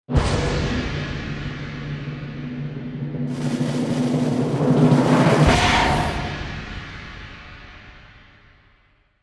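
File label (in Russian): правильно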